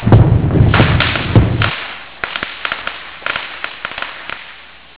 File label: crackle